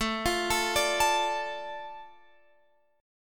A Suspended 4th Sharp 5th, Guitar Open F; Asus4#5; arpeggiated; x,16,17,16,17,16

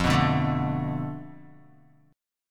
F 6th Flat 5th F Guitar; strummed; chord; 0,2,2,2,2,x; JGuitar

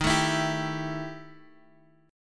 E Minor Major 7th Double Flat 5th D sharp, guitar

